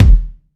warm sounding bass drum sample D# key, Royality free bass drum single shot; kick